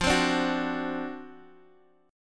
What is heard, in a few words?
D 7th F, guitar; D7/F strummed; JGuitar